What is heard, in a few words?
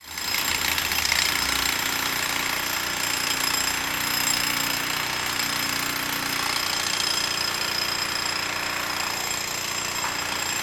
ambience construction jackhammer and power drill